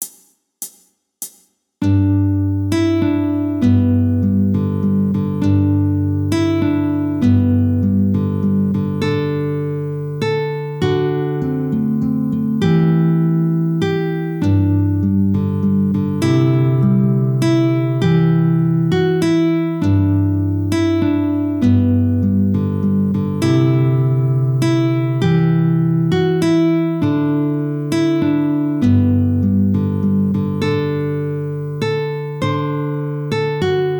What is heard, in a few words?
Silent Night Medium